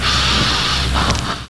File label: zombie idle